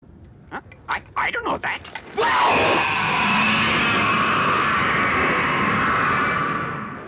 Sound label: bridgekeeper scream